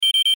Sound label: System pipipi higher